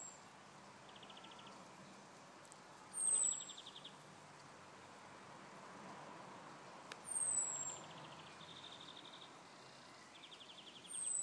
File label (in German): gezwitscher